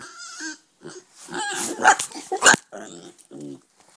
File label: mad piggie sound